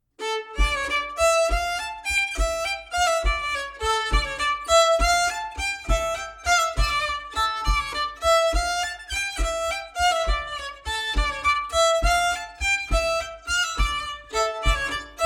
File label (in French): Bourree d Auvergne